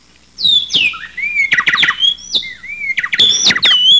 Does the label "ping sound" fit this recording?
Yes